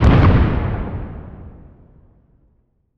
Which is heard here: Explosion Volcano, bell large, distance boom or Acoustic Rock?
Explosion Volcano